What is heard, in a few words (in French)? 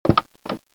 Ouverture de portiere